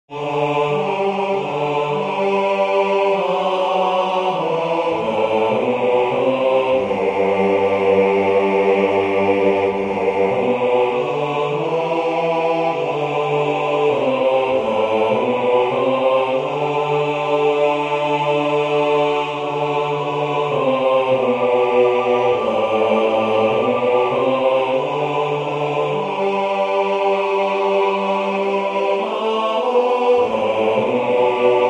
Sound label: Waly Waly bass